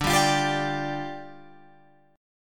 D Minor Double Flat 5th, down, Guitar, Open F; Dmbb5; strummed; JGuitar

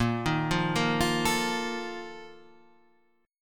A sharp Major 7th Suspended 4th; A#M7sus4 Chord {6 6 3 3 6 5} arpeggiated

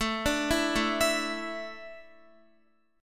A Suspended 4th, Guitar; Asus4; arpeggiated; x,12,12,9,9,11

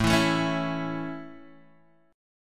A Major, Guitar, Half Step Down

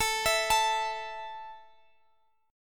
A 5th A down Guitar Half Step Down

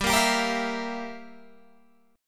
G Minor 13th G down Guitar Open G

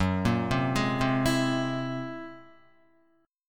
F sharp 9th Flat 5th, Guitar; Listen to F#9b5 arpeggiated

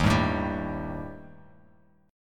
Eb Major Flat 5th Eb Bass Standard; EbMb5 strummed; 11,10,7,12; JGuitar

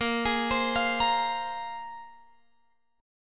A sharp 7th Suspended 2nd, Guitar, Open F; arpeggiated; chordshape

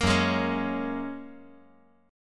F sharp Major Add 9th, guitar; chordshape; down

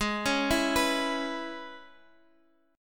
C sharp Minor 7th G sharp, chord

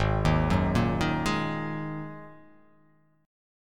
G sharp 7th Suspended 2nd G sharp

